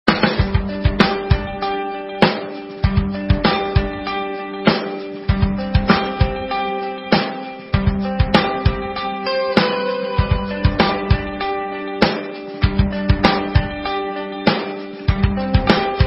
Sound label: Guitar Strings